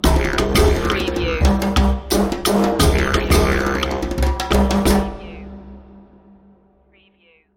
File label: outback groove stinger